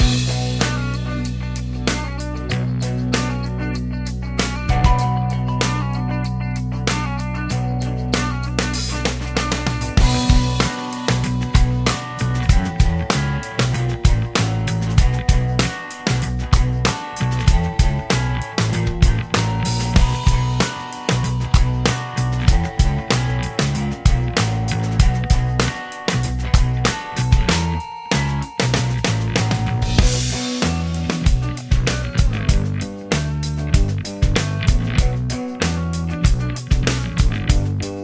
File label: Instrumental